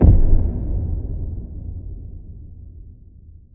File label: Boom Kick